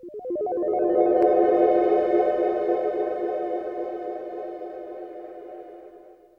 SYNTH FX; AMG; Global Trance Mission VOL-2

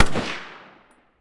RIFLE; Roland - LA Composer vol. 1

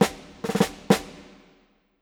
Snare, Orchestral; Sonic Foundry (Sony Creative Software); Orchestral VOL-2; Modern 06

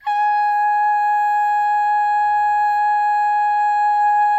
DOO MIX, Vocal Planet Jazz, GUY GAL

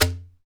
PRC TUBE, Perc Toys; Spectrasonics; Supreme Beats; World Dance

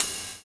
Ride fx; Roland MV8800

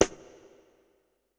Kit, breakcore Drum Hits

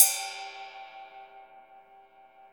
CYM Ride; Best Service Gigapack 2

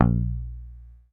MUTE A#1, Bass; Sampleheads; Will Lee Bass Library

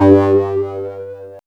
LEAD L; AMG - Vince Clarke Lucky Bastard

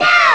doo; ST-XX Amiga Soundtracker Sample Packs

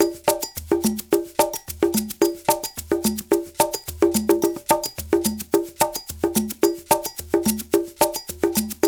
108 Soukous, African Contemporary; Spectrasonics; Supreme Beats

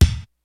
KICKS; Kid Nepro; Elite; KICKS SNARES

